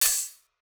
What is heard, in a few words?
Hihat Open